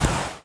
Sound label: Ion Thrust Combined Loop; Player